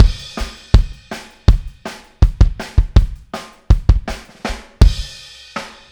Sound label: Kick, Drums, 162 BPM; Take Your Toms Off